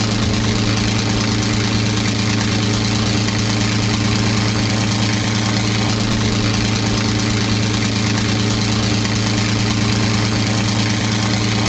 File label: P51D rpm; mustang